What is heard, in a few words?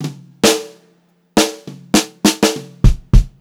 FILL, Funk-Groove Drumloops; USB Soundscan vol.38; AKAI